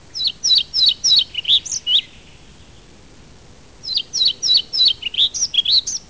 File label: Ficedula hypoleuca